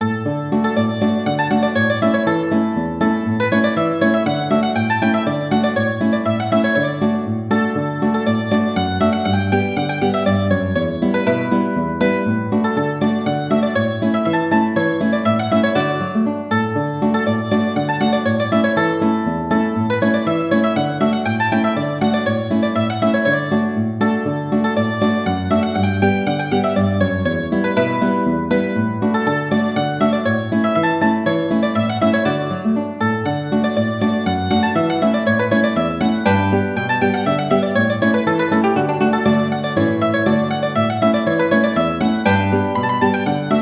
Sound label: mandolin guitar, mandolin-guitar duet